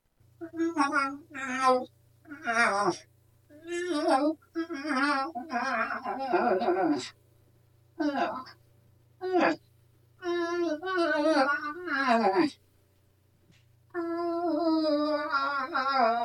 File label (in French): call femelle; Wikwemot